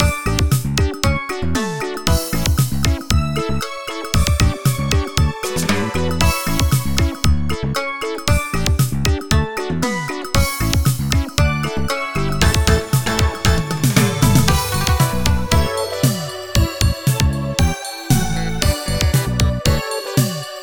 Bgm Main

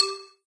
Combo Sound; candy crush saga gamerip

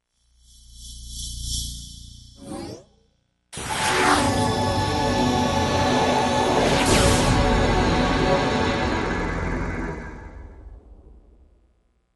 Push Start Button; Armored Core 2 Another Age

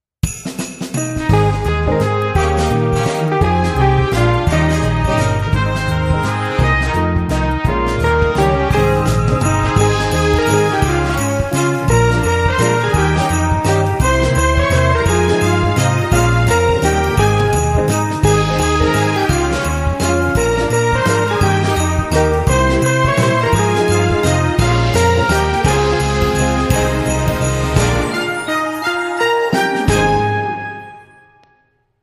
We Wish You a Merry Christmas (jazz classic)

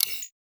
Test Timer; Yu-Gi-Oh! Master Duel

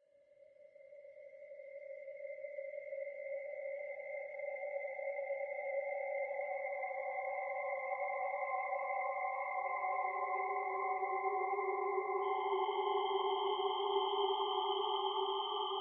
Music Atmo; gamerip; Ballance

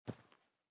SFX step rock; gamerip; Out of Reach: Treasure Royale

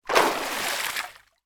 water movement fast

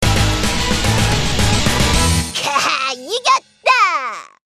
Result Win; fortress new

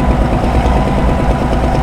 tractor idle

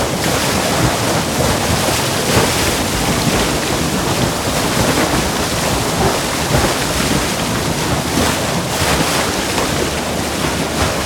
BOAT INBOARD; Piranhas

